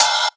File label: light open hi hat b key